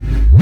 hardbassdrumz, breakcore; Bazzdrumz

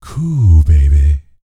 Vocal Planet R&B Dance BASS CATCH

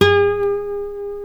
A NYLON, Guitars

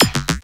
PERC FX

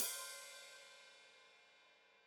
Hat Foot Open, Hi-Hat; Deeply Sampled Drums

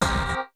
perc, Roland MC-909

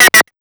FX; Big Beat Drumloops

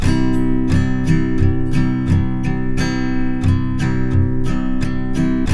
TK MJ C#, Guitars; Spectrasonics; Hans Zimmer Guitars VOL-1

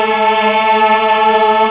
MELLO CHOIR

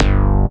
Boogie bass (G), Synth Bass One Shots

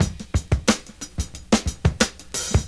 OLD SCHOOL, Breakbeats

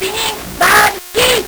LSDj, Nintendo Gameboy; 300 Drum Machines

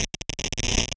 sum clickz

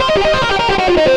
xtra lead

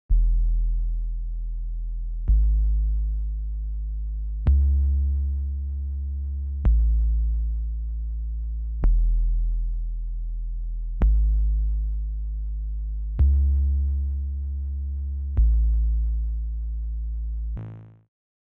Bass, 110BPM Emaj; 99Sounds; Monosounds; Music Loops; PANDORA BOX!

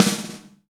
Snare Ghost; Roland BK-5; Snares

